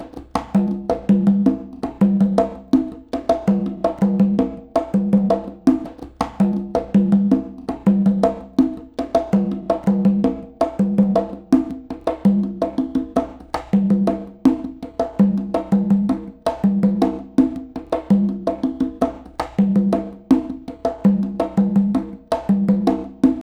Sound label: mix conga